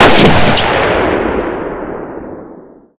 Mosin Nagant Sniper Shoot; Minecraft; Infamy; Last Alive Pack

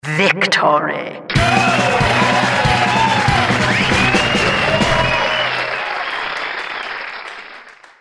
your team won; Team Fortress 2

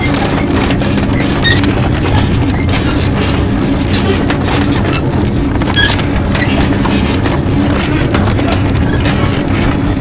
cart rolling; Team Fortress 2

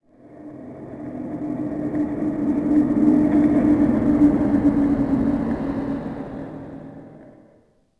City Flyby Med; Ambient; Sid Meier's Civilization 4 Complete; Beyond the Sword

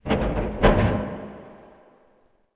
Goodyhut Metal; Ambient; Sid Meier's Civilization 4 Complete; Beyond the Sword

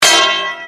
sword clank metal; Mount&Blade Warband

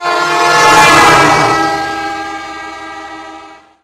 bus horn; vehicles; Left 4 Dead 2

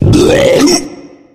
male boomer warning, voice; Left 4 Dead 2

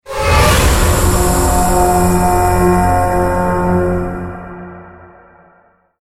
deathcam, music; Counter-Strike Global Offensive

